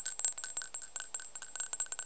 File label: bell